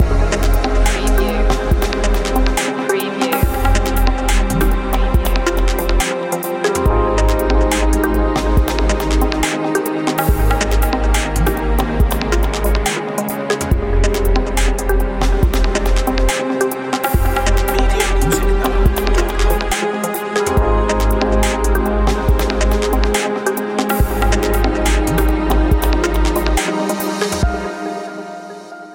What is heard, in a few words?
deep dub garage